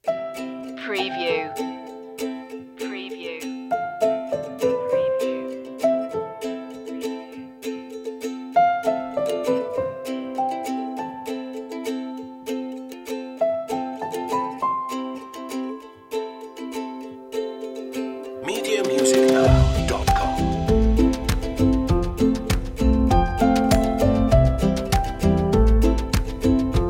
ukulele weekend less lead

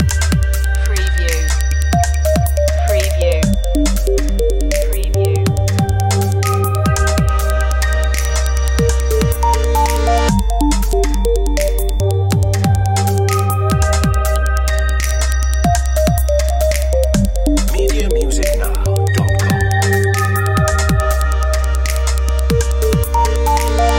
subsequent loop

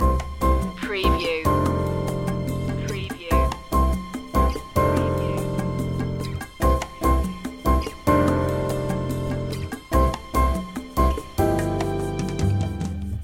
love bossa loop

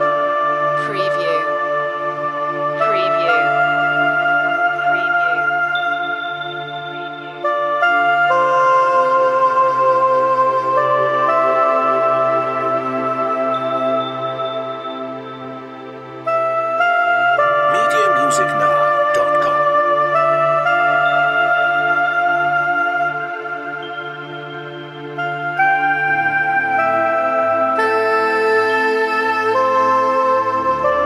soprano sax air loop

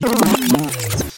Turntable Scratching Backspin

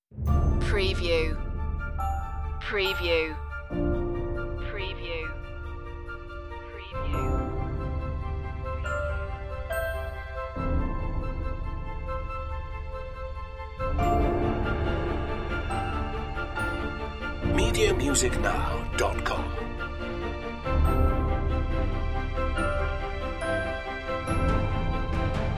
glory and sacrifice no choir

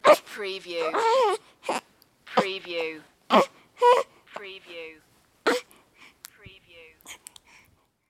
Baby Complaining

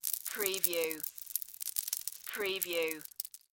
Debris Small

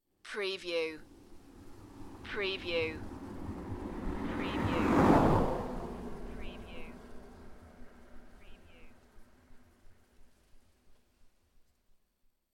Car By Country Road

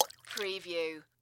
Splash Fishing Lure

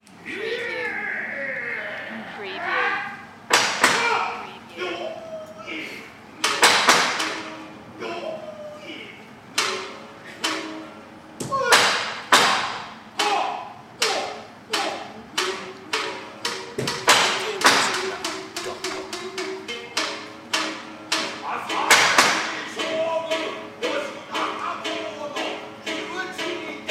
Kabuki theater male performance whips Japan

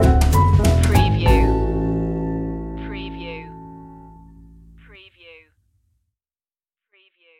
jazz stinger; we wish you a merry christmas

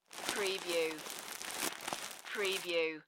Bread Wrapper Close; sound-effects-bible

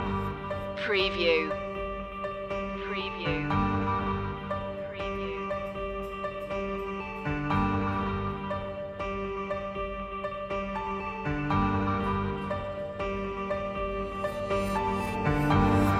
descant sky loop

